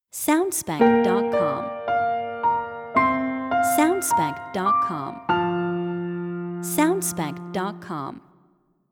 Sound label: Soft Piano Logo